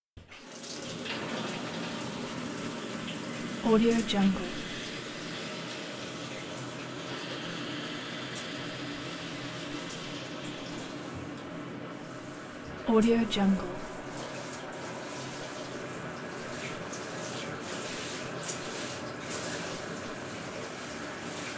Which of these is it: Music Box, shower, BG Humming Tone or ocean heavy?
shower